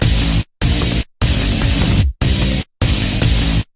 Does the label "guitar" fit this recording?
Yes